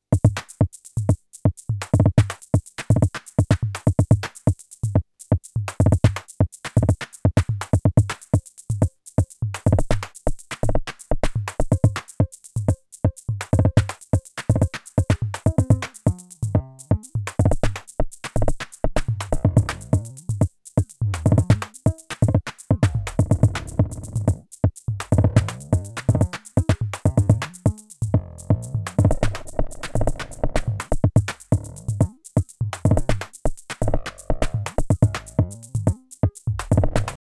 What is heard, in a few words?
Erica Synths LXR 02 Flanging Drums; Sonic Potions